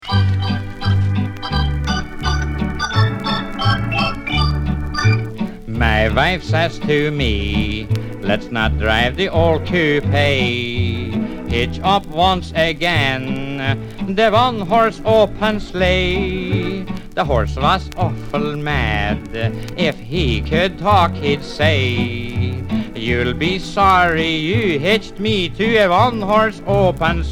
Jingle Bells; Special Ed Xmas

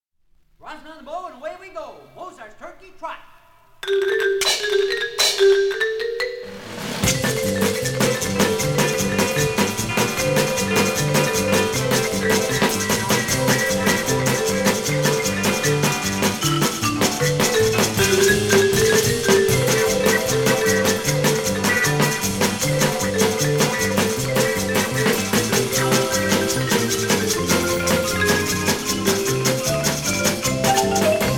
Marimba Band; The Fairfax High School Marimba Band

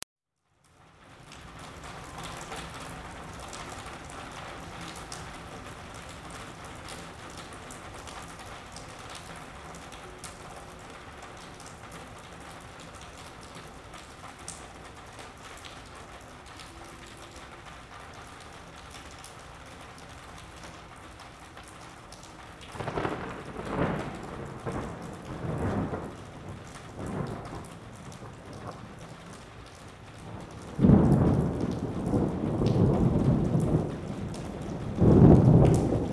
Rain With Thunder

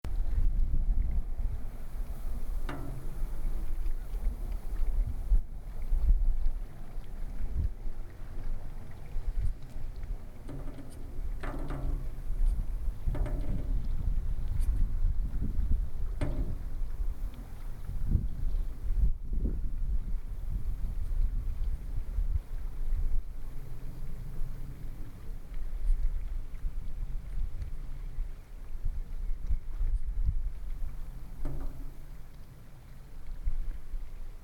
rickety dock; sad end

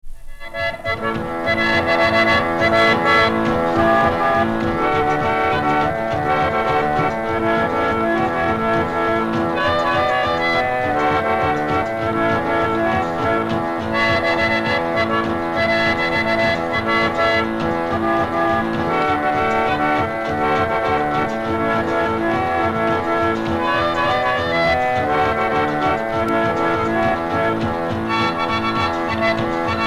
Organ Grinder

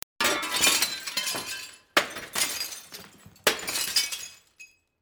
Shards, glass

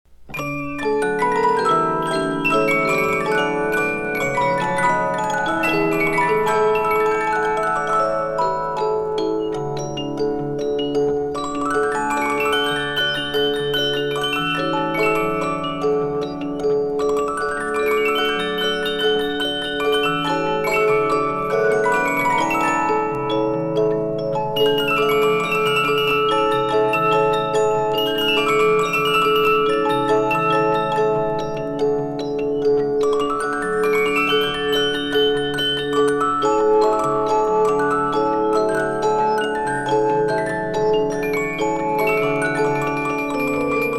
Music Box

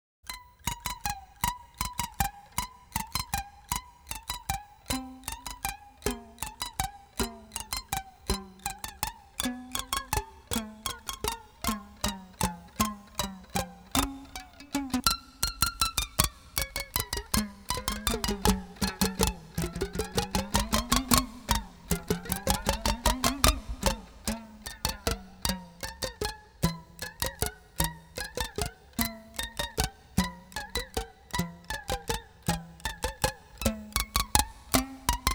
Ring Rubber Bells

